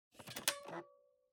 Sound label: Optical Disk Drive Close